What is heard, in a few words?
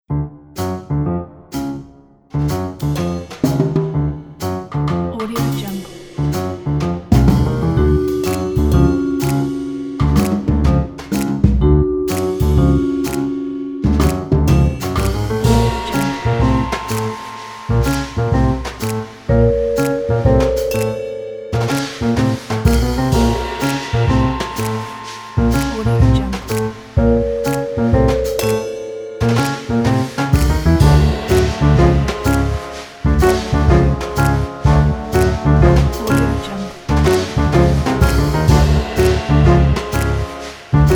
sneaky piano; animapiano